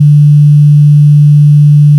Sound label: fm cello single; FFTimbre